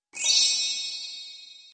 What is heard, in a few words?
ping